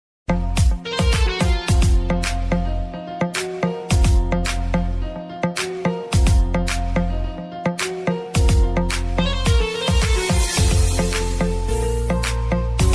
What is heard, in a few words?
instrumental ringtone song ringtone dj song ringtone